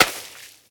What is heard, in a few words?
Shooter Splash